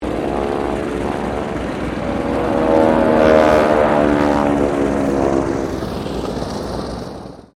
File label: AIRCRAFT UNKNOWN PROPELLAR SQUADRAN PASS; Airplanes; Vehicles